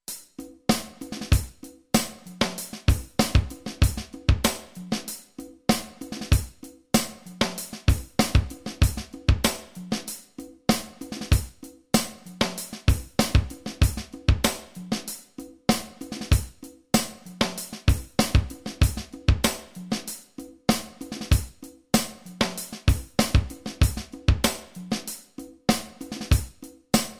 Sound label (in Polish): funk perkusja